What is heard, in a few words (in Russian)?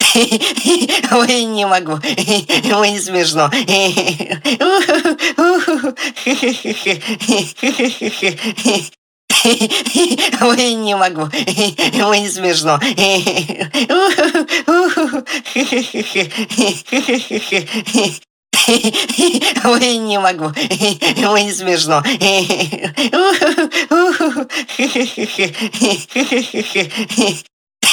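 Zvuk smeha, laughter sound; Zvuki smeha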